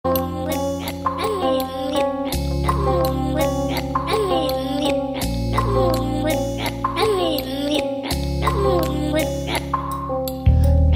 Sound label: Bangla music